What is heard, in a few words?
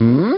humm; BattleSNDS